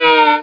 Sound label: Viola Slide; MacAddict; Stay Tooned Demo